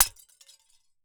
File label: Crashes Impact Sledge Hammer And Plastic Cup; AdobeCC